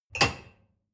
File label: dishwasher cup grab soft